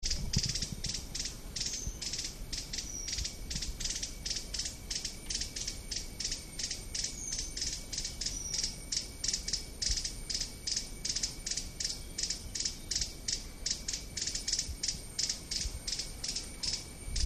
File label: Grey bellied Tesia Tesia cyaniventer, grey bellied tesia call